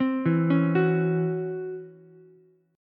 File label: Db Minor Major 11th Db Ukulele C6; DbmM11 arpeggiated; JGuitar